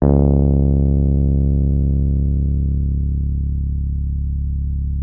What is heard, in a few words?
Rhodes C#1; Xsample VOL-1; 90 s Sample CDs